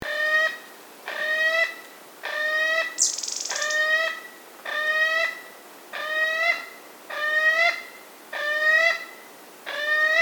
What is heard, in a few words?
black billed mountain toucan